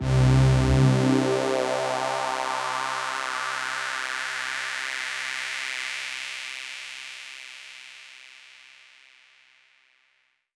Pad C, Synth Prophet One Shots; Electronisounds

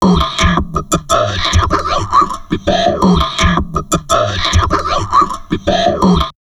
TALK 160, Drum Loops Crazy Processed; USB Soundscan vol.07; AKAI

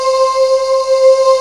VOX C4

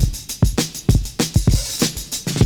70s Breakbeats; USB Soundscan vol.46; AKAI

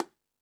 Jungel Percussion, E-MU Pro-Cussion

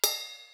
crash, Millenium MPS-400

